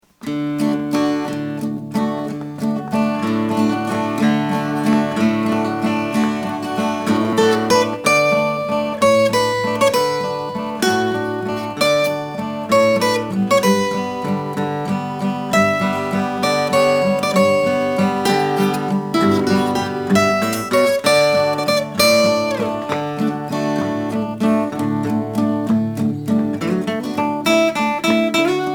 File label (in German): Walzer; musik zum film; Dangast; NDR; opn doerp dangast kuehe kueste kuenste